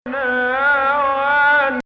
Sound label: Nahawand, maqam